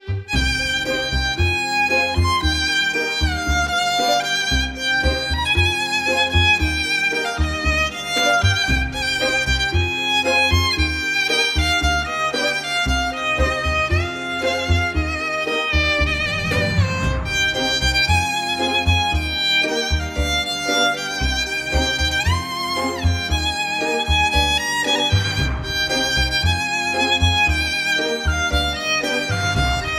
Dance Violin

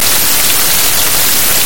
Smack

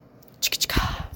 Roys mating call

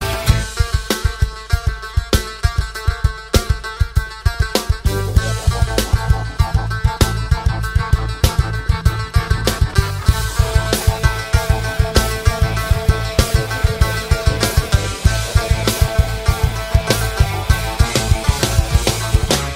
Rock Techno Guitar

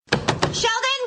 Penny Knocking Once

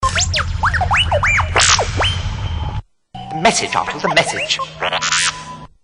R2 C3p0 Message

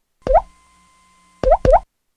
Funny Drop Sms

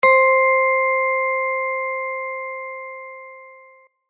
pingggggggggg

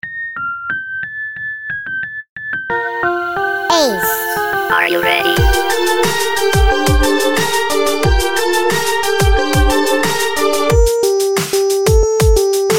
Mario Type Beat

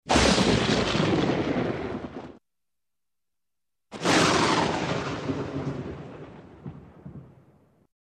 Lightning strike

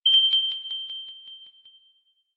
Notif; Note 2 Revised

